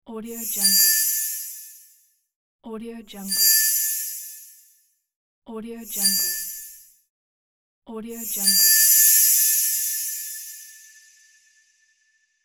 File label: diamond sparkle